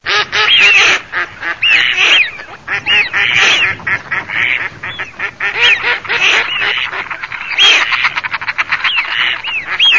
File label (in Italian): FISCHIO GERMA MORIGLIONE